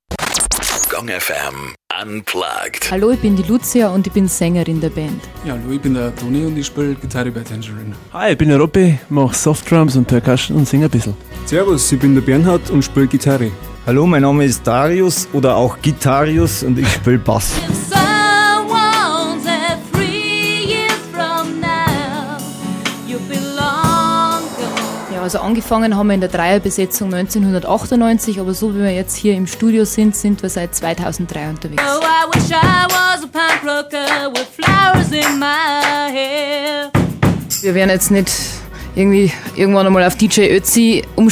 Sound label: gong fm unplugged